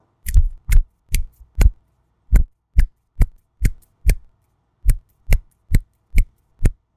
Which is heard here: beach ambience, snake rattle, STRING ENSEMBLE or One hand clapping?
One hand clapping